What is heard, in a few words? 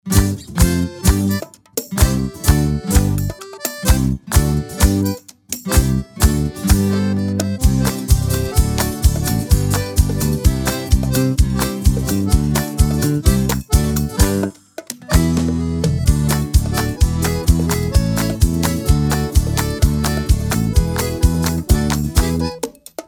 Jingle; Mineiro Delivery